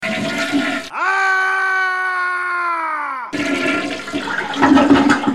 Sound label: flushing down the toilet